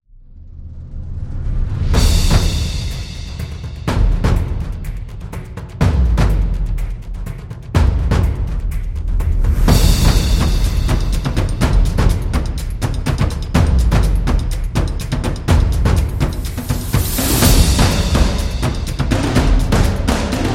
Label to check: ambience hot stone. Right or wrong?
Wrong